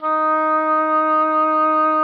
Oboe, D#4; Xsample VOL-6

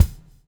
BUBL, BUBBLE; Spectrasonics - Backbeat

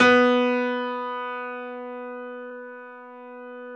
PIA HIGH G, Grand; Sound & Vision; Gigapack I CD 2; PIA GRAND 1

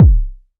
BD, Waldorf Blofeld

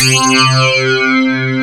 pluck C3, FM pluck

